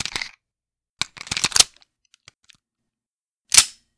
Browning Hi Power Handgun Being Reloaded While Empty Full Sequence